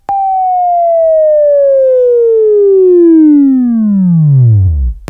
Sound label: WAV Right, Sweeping; Sweeping Training program